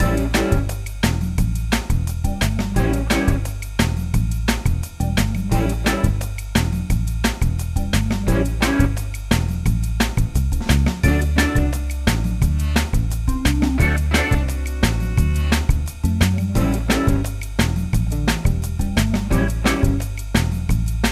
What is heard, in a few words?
Action; Schlag den Raab Das 2. Spiel; 3DS